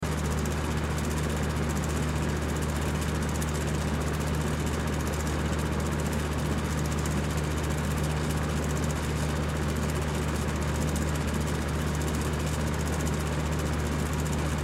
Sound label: Ceiling Fan High, Ceiling Fan High Loop; Fans; Electronics; Household; Blastwave